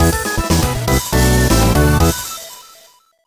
Fanfare Unchanged Ranking; Keep it Up!

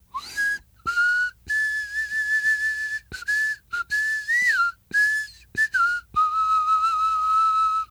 male whistle

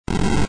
Fire Dash

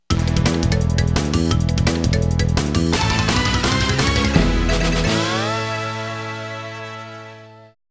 Course Intro Fanfare; crash team racing

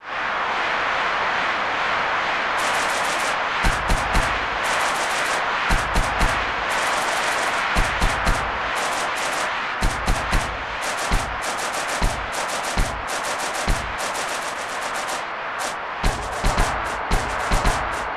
Soccer Game Ambience

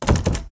door locked

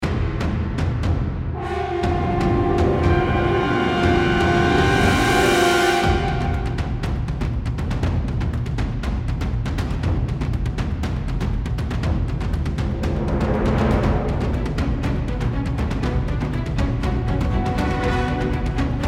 Field BGM; Xenoblade Chronicles 3

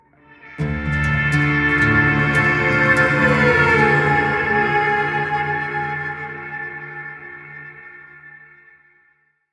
Sting Secret

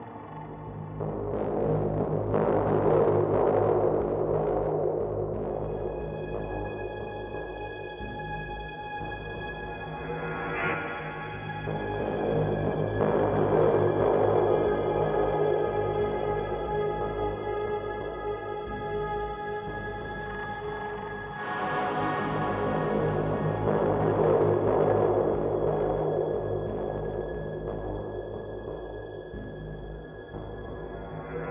Exploration Loop; Execsearch

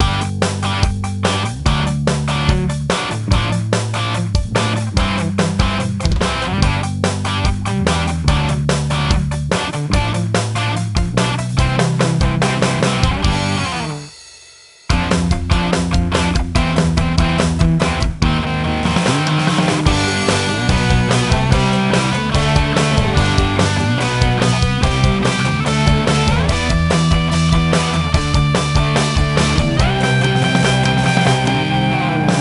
Skate Track No Vocals

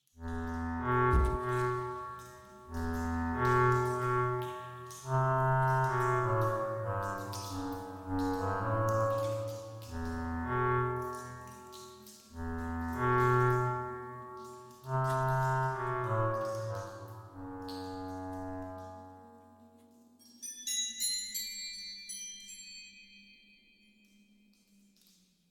The Underground (Ambient); Asterix at the Olympic Games

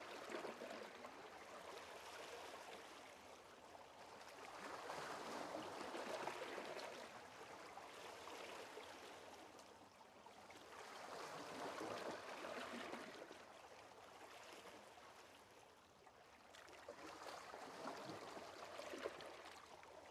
Pond; boomerang fu

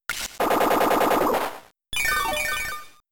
Giga Drain; Pokemon; Gen 3; attack moves; RSE

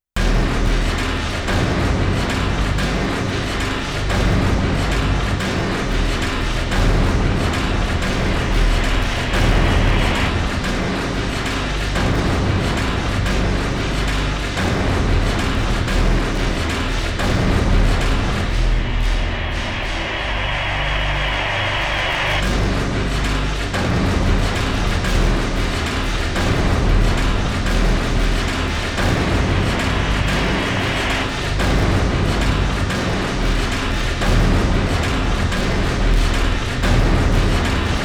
die; silent hill original soundtracks 1999

